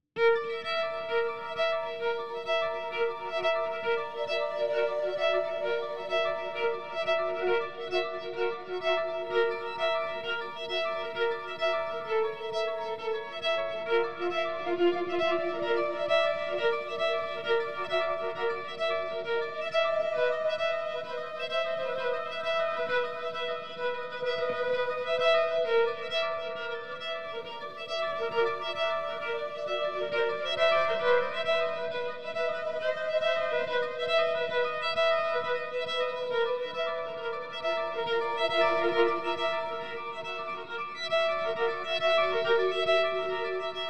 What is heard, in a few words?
Bowed Rhythm; Hitchcock